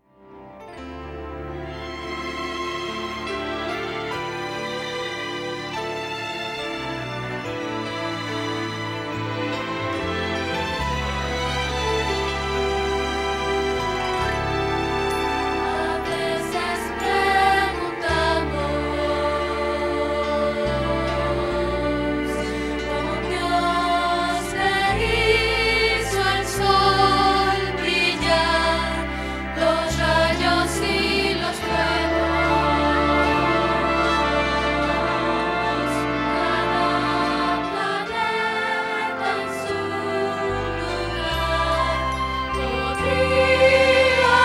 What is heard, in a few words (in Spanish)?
Contralto